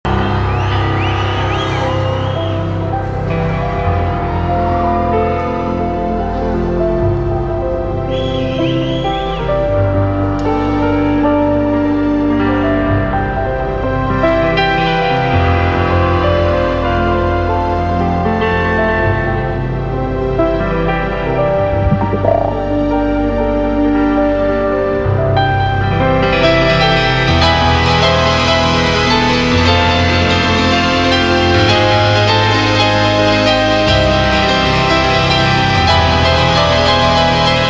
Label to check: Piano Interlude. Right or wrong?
Right